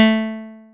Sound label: plucked, programmable speaker